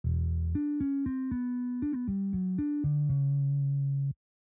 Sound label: distorted bass